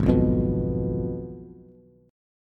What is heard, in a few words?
Ab Minor 6th, Ab, Bass; Abm6 strummed; JGuitar